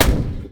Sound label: auto; Starsiege